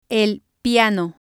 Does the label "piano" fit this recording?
Yes